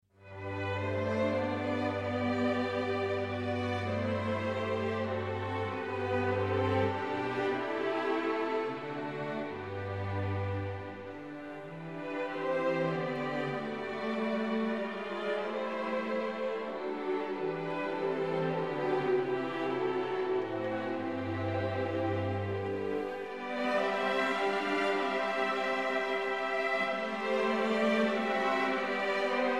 Capriol Suite for string orchestra, Pieds-en-l'Air; Warlock